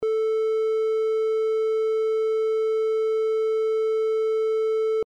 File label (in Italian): Onda Triangolare a 440Hz